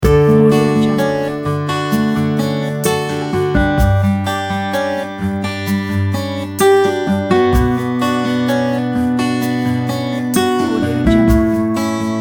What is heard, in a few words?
easy light folk